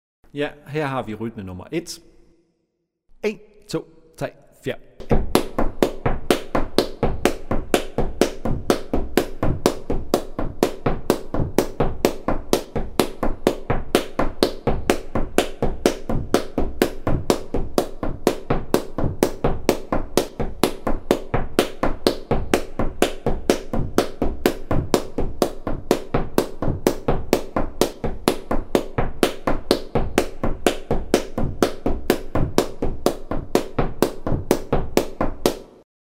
stomp rytme stamp

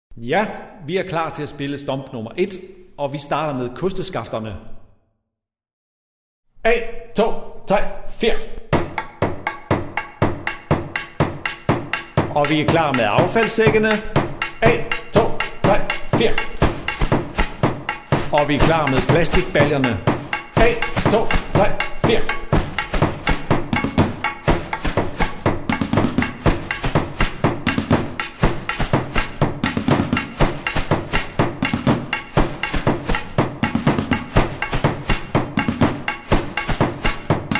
stomp 2 stamp

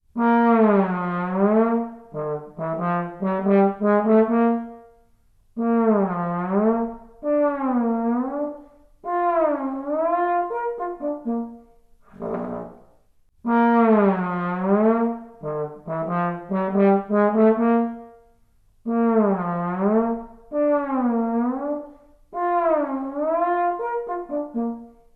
basun

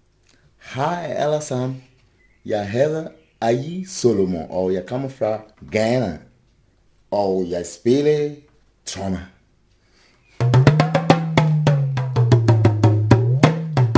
instrument tromme percussion

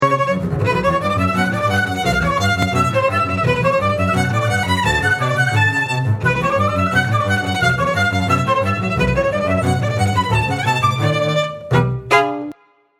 Troldekongen Forte; Lyt til klassisk

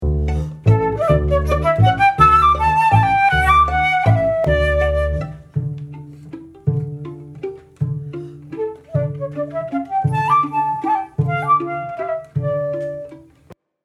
Anitra Diminuendo

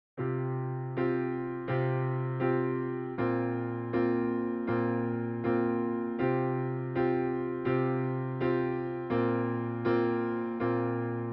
klaverakkompagnement til vand; ninnas orkester

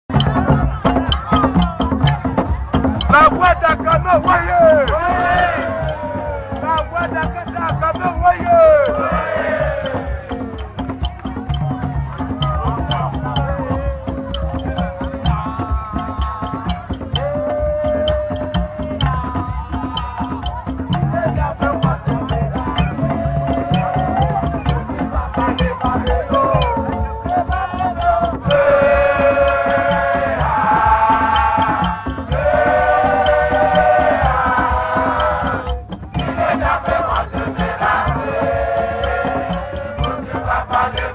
afrikanske trommer; caspermikkelsen

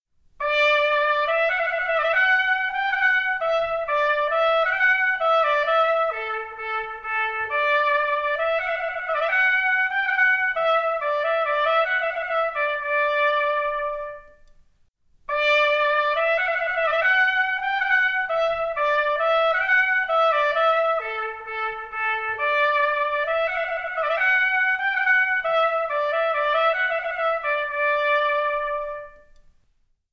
piccolotrompet

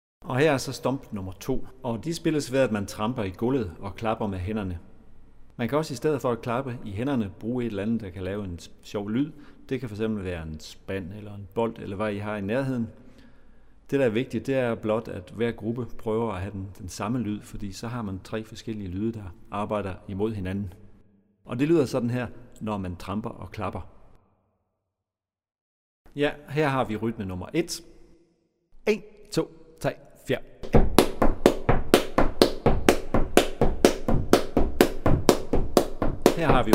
stomp 2 stamp

